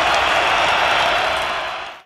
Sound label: clap